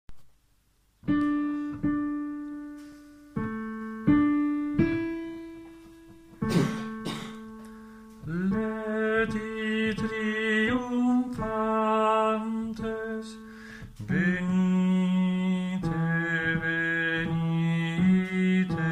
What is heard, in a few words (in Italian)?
adeste baritoni; Adeste Fideles